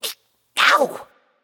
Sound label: ouch; smite; voicepack; jingwei